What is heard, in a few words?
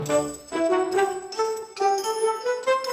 funny